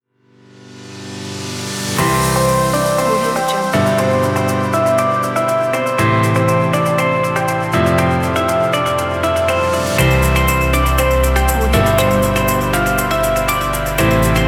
background corporate

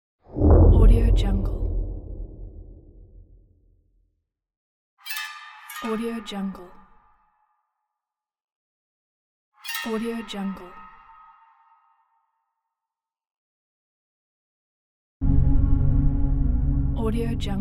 suspense pack

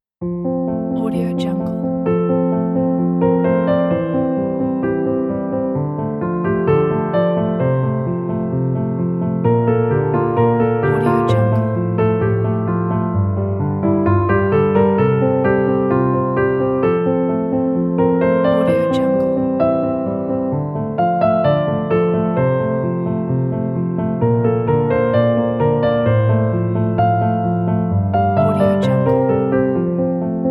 inspiring piano

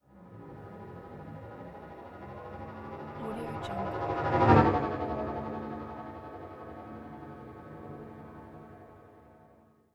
scifi vehicle passby

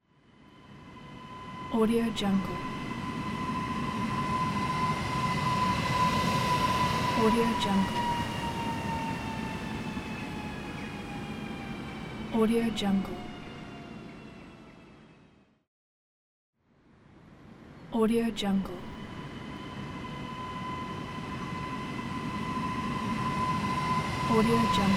train passing

